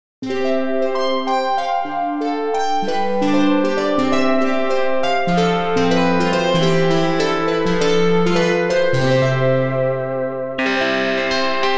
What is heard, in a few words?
sweet melody